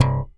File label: YAM THMP C 1, Bass; Masterbits CLIMAX 7; PATITUCCI BS